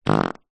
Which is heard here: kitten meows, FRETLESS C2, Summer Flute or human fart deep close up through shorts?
human fart deep close up through shorts